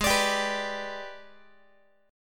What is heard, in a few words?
G sharp Minor Major 7th Flat 5th G sharp, Guitar; strummed; JGuitar